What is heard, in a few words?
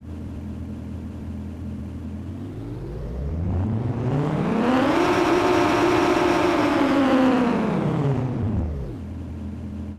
sports, engine sound